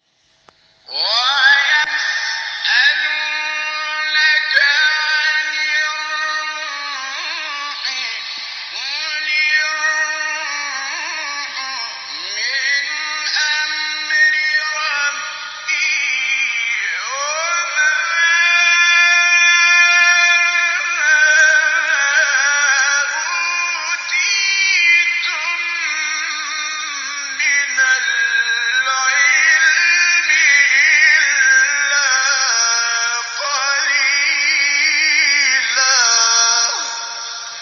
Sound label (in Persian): تلاوت آیه سوره اسرا; آیه 85